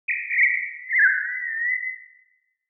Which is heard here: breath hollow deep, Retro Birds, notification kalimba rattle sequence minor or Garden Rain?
Retro Birds